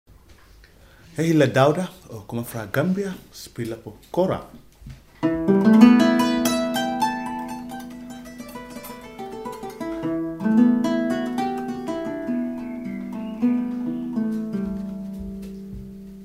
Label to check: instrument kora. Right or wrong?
Right